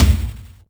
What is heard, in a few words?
war drum high; Crypt of the NecroDancer